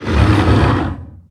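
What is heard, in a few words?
enemy attack dragon roar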